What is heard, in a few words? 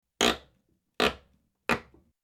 Nail Pulled; Construction; BBC Sound Effects Library